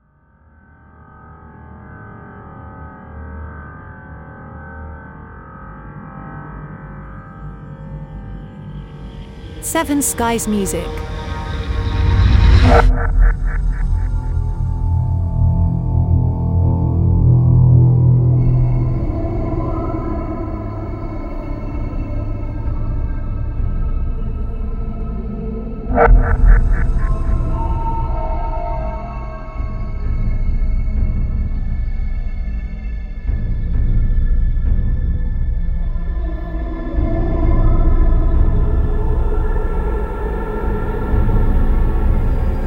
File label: Dark Ambience